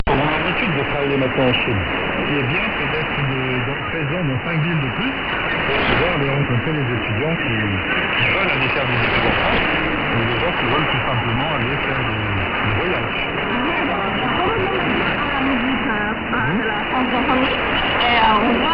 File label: netSDR; CRI